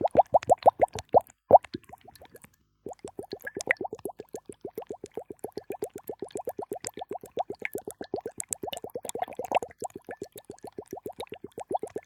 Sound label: bubbles; NS Studios - ultra sound collection